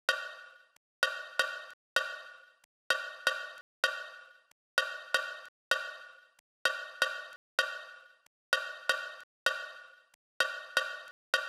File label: cowbell, Key F#, Bpm 128, Big Room House; Loop Nerds; Big Room House Allstarz